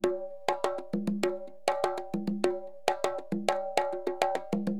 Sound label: CONGA, Perc; LiveAct Pool; AcidJazz Funk